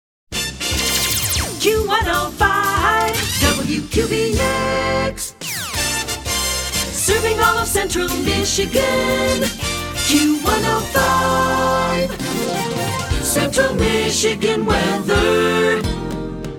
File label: Jingle; Short Attention Jingle Show II